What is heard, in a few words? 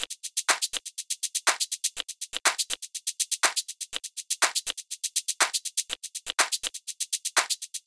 122 BPM Tops, percussion; Percussion Essentials 1; MMB